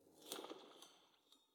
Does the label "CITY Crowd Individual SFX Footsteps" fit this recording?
Yes